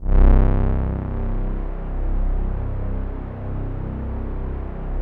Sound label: OB LOW P